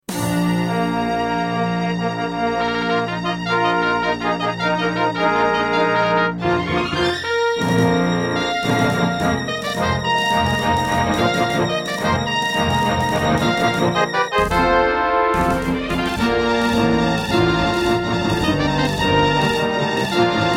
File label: Intro, V7 media fx; Dark Forces